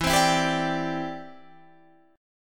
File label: E Minor 7th Sharp 5th, down, Guitar, Standard; strummed